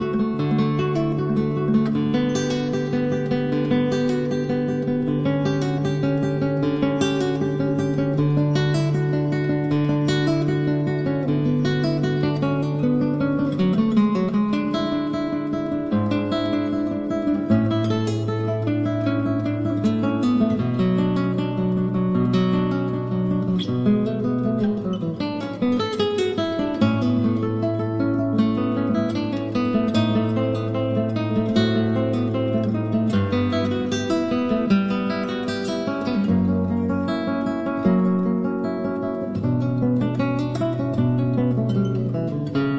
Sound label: Cello Suite No. 1- Prelude; Bach, Johann Sebastian; arr. Michael Lorimer for guit